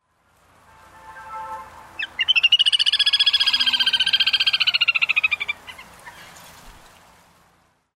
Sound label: little grebe